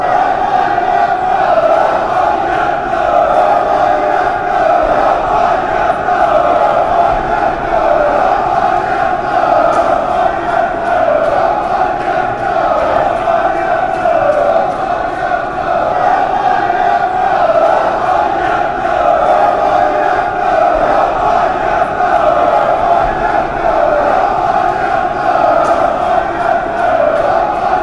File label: come on you blues, fanchants